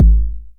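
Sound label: 909 BD tape, kick drum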